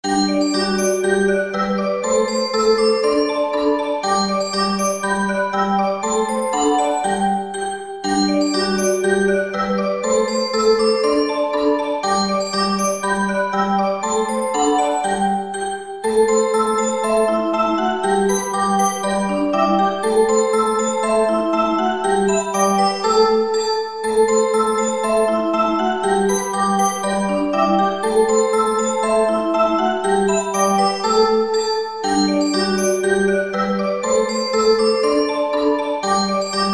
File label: Glenside Polka